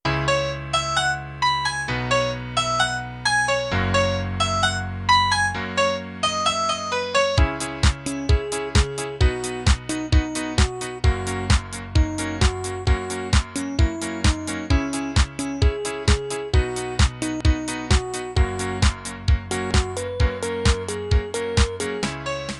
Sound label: ringtone verse